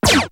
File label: gunshot; singe; Space Pirates HD